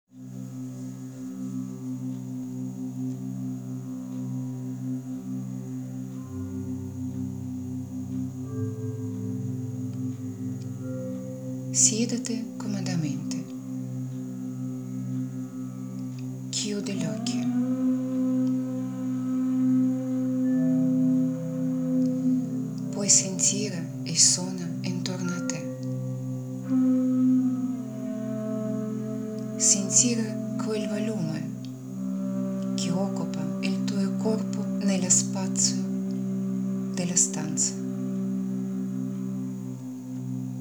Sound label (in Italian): Suono Terapia Armonica con Campane Tibetane